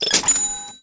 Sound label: CHA CHING; RnC Sounds